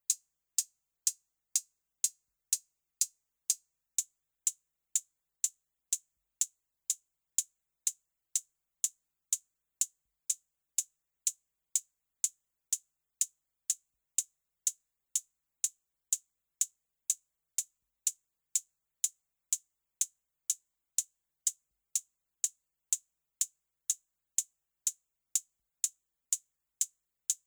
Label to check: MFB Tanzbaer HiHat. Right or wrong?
Right